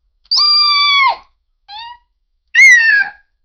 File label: small puppy hurt yelp sound